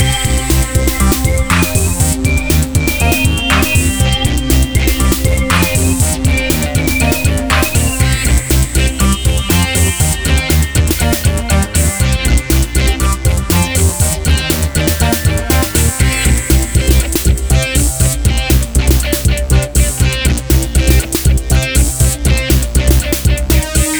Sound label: Music